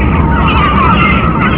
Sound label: SEAGULL